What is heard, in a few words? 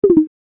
Bar chart fade out animation infographics